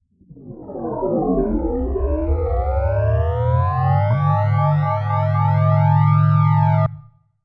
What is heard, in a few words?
Charged Up